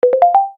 Brisk, notifications; OnePlus 11

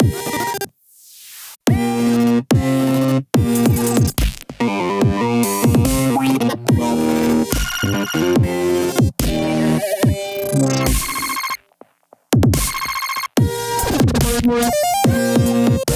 Ring Steppa, ringtones; Yota; Yotaphone 2

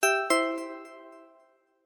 Email, phone notification tone; Tecno Spark Go 2023

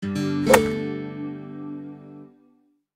Golf alarm; Nokia; audio themes

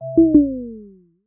critical lowbattery; Oppo; A54s; ui

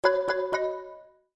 Marimba

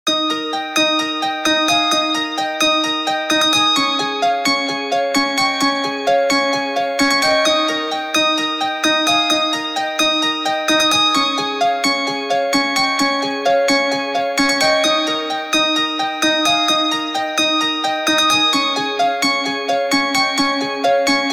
Breeze, ringtones; Lucid 4G VS840; LG

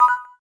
Ear Jack, Samsung SGH-i710

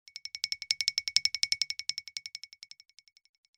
Tap Lightly, alarms; Coolpad; ESurfing-No1-2021

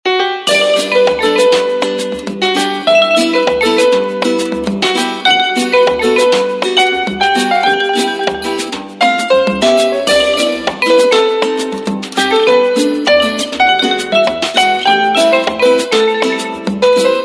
Ringtone, Samsung GT-C3110

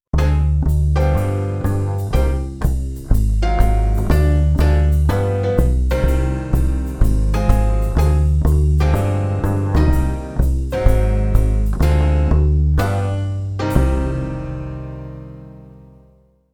JAZZ Cool, Windows Mobile ringtones; Microsoft

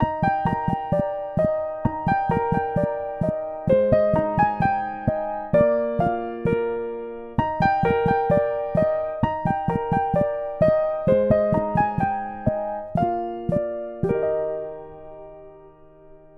Lullaby Piano, ringtones; Motorola; Edge 70 Max China

